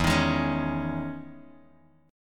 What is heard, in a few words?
E Major 13th down Bass Standard; EM13 strummed; JGuitar